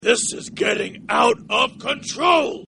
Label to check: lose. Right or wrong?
Right